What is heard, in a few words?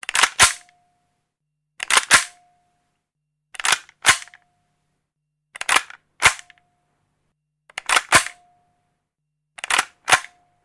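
Gun Loading Mechanism, handgun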